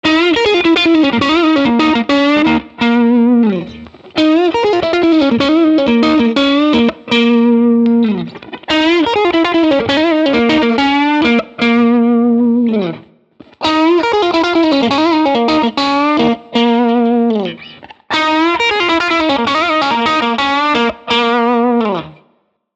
Lick Neck Neck Middle Middle Middle Bridge Bridge, guitar; Fullerton